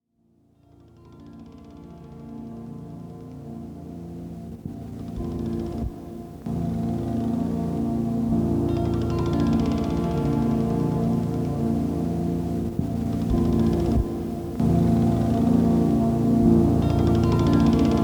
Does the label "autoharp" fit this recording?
Yes